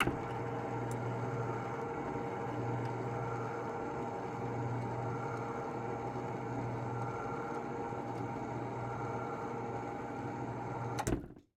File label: Projector screen, automated, motor, canvas, roll up, catch; Eiravaein Works; Vaeyan IV